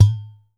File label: udu